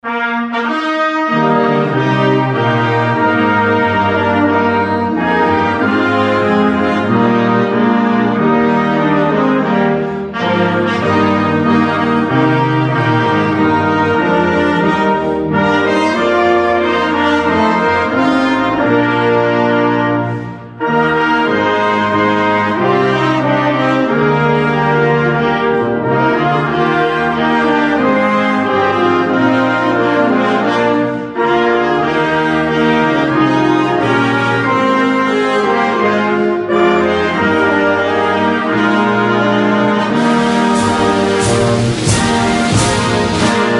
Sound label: die stem orch